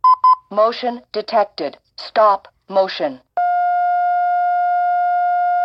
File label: Motion Detec